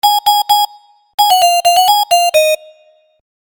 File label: Mail jingle alt